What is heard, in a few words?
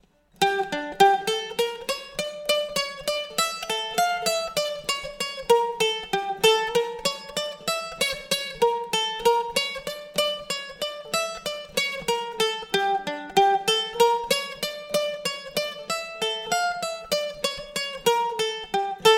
staro pajdushko horo